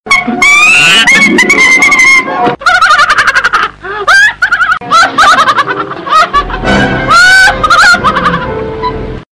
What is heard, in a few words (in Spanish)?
un tono curioso